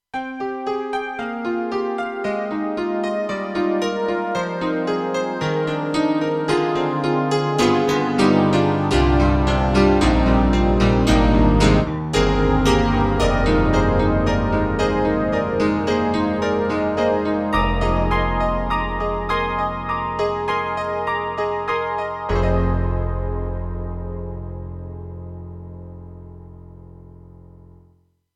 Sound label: Grand; Roland; JV-2080; MIDIed Grand